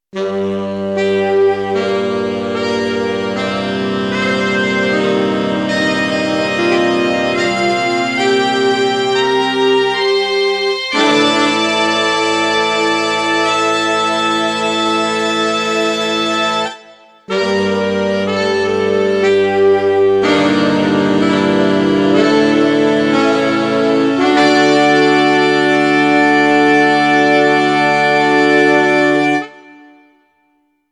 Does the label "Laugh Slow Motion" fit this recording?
No